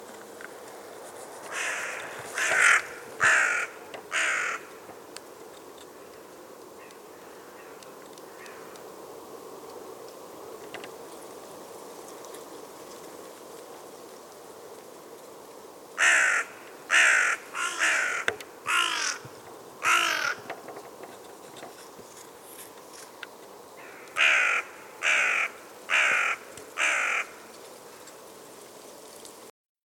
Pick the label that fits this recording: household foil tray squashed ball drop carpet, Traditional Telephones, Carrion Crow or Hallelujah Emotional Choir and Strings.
Carrion Crow